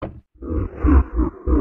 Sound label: giggle